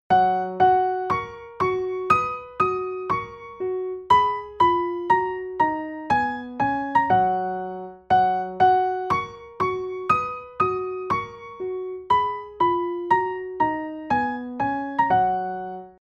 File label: Twinkle in F#; scales